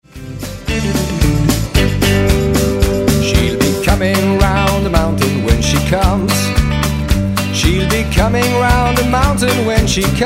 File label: mountain music medley